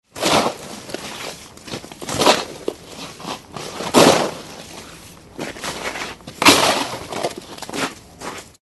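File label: sounds of shovel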